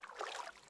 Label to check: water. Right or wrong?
Right